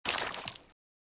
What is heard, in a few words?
bloody hit; sorcery